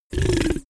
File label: monster growl short; kreaturen